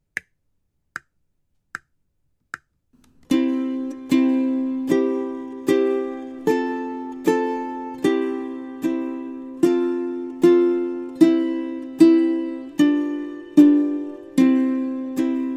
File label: Twinkle Twinkle Little Star